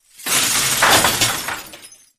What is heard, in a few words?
Glass Metal Crashes; windows & mirrors